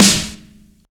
clicky steel snare drum sample g sharp key

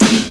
fluffy snare drum sound a sharp key

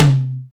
tom one shot c key

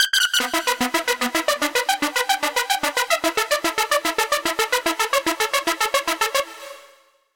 hardstyle lead redundant - em; instruments; loops

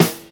fresh smooth snare sample e key

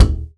hi tom single hit d sharp key; tom drum

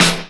sizzle snare one shot f key; snare-drum-samples

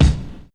subtle reverb kick sound c key

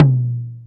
reverb tom drum sound c key

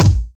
smooth bass drum single hit G key

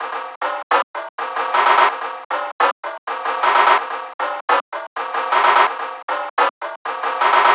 brazilian repinique sequenced percussion

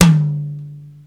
long room reverb tom one shot d key

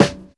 strong bottom end steel snare drum f sharp key

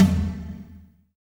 low mid tom drum sample e key

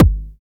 clean kick single shot g key

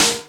smooth snare drum sample a sharp key

